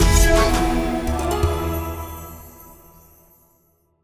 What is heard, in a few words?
Small win; netent